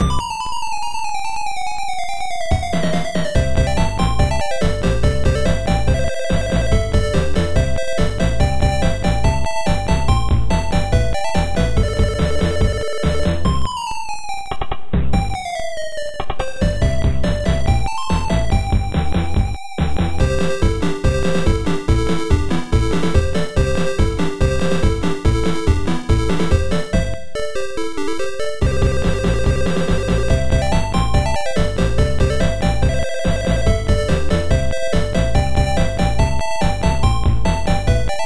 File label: Bonus Stage BGM; Shanghai III